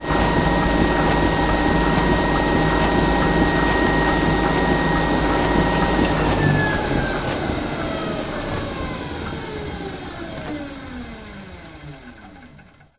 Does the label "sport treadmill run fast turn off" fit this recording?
Yes